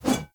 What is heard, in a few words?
One Handed Axe Whoosh; Weapons; Enemy; Axes; Sinecure One Handed Axe